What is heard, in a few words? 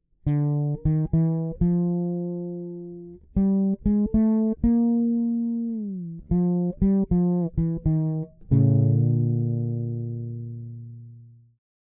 Fretless Drive EQ bass boost mid high cut treble cut; Tonehammer